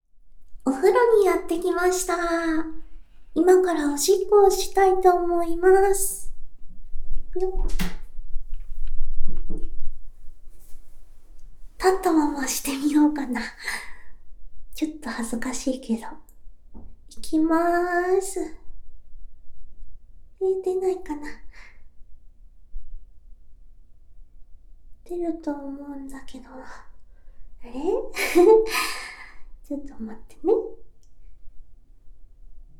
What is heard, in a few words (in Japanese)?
お風呂で立ちながらオシッコ！シャワーシーンも！, ASMR; 人生初の立ちション！